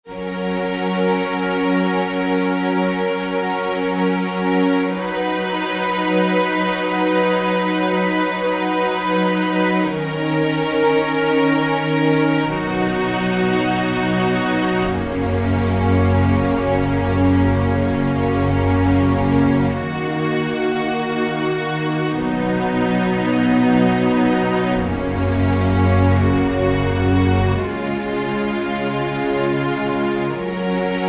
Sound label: Underscore PAD; Worship Flow